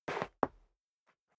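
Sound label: step dress boots concrete scuff, footsteps; schritte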